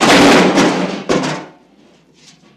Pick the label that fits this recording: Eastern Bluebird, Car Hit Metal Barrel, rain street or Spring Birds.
Car Hit Metal Barrel